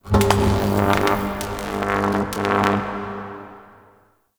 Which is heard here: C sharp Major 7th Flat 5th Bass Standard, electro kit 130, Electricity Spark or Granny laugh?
Electricity Spark